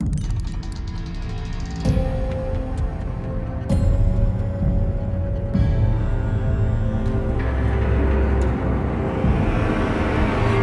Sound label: String; Assassin's Creed Unity Dead Kings